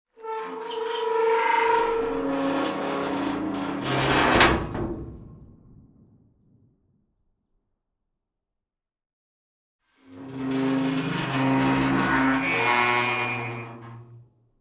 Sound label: Door gate A Heavy Metal Door On The Garden Gate